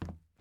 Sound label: plastic step; teardown ps5 windows xbox series xs gamerip 2022